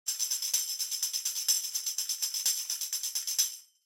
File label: Tambourine Headless Pattern